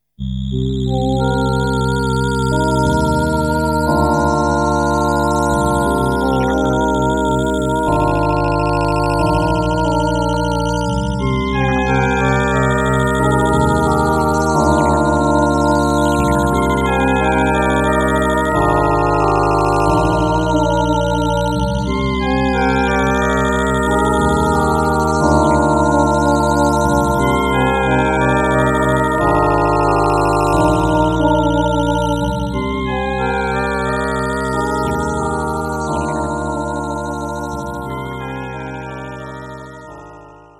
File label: esq1